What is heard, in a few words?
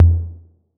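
808 low tom; Electronic; Wizoo - Platinum 24 Electronic; CHR TOMS 808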